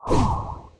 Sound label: attack; Fly For Insanity